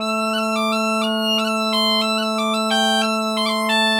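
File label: bagpipe 90, Celtic Flavours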